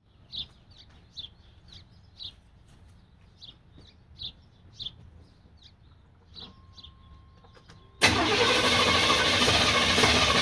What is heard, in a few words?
starting issues 1988 c1500 5.0l